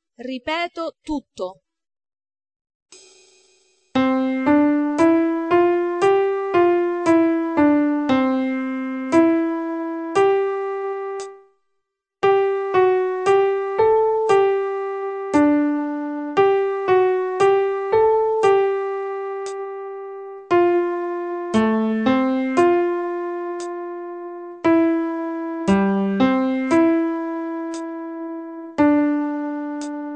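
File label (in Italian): Modulazioni Convergenti mag, Modulazioni convergenti da tonalità maggiori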